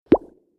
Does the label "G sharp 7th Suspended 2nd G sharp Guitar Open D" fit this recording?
No